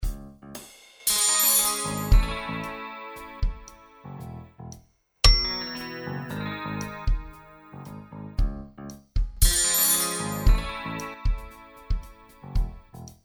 metal fxs; amore&guerra(2006)

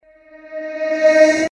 candies eh reversed; amore&guerra(2006)

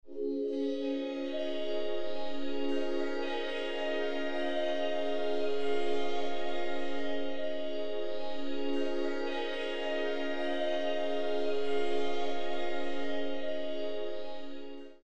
Shimmering droplet convolution; amore&guerra(2006)

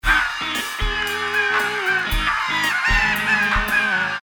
seagulls in context; amore&guerra(2006)

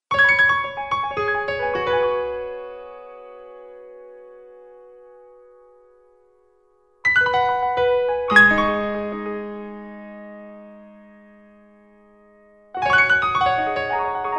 Piano 2nd verse; amore&guerra(2006)

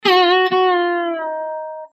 slide guitar; amore&guerra(2006)

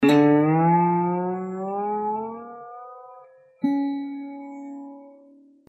slide guitar; amore&guerra(2006)

